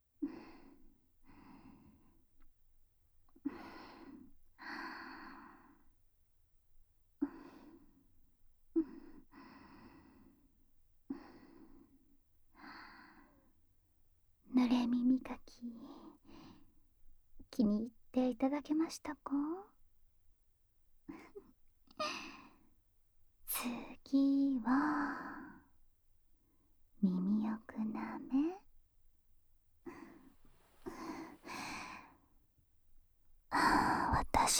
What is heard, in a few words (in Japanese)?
にゅるにゅる舌先♪耳奥舐めローテーション